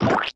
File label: Squish Sound